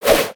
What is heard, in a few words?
Massive Spear Swing